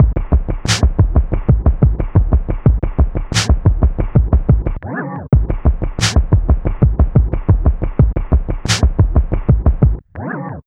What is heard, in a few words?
slap 090bpm; Zero-G; Downbeat Dance Grooves